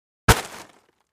Foliage Lawn Bag with Branches Type 1 Dropped on Ground; hits & impacts